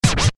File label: ui scratch; Motorola RAZR-V3c